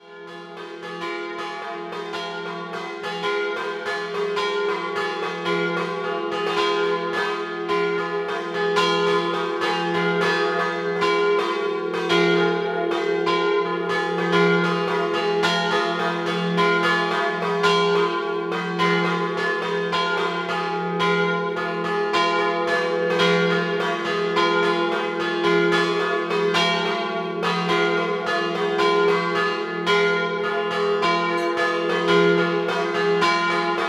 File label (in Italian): Ruttars, campane